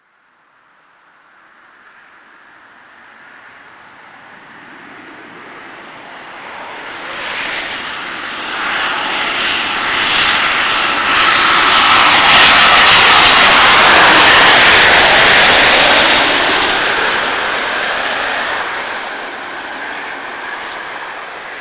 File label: Airplane